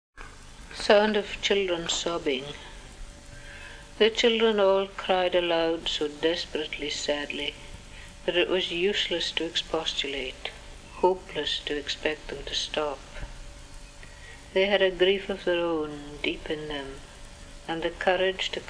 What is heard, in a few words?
Sound of Children Sobbing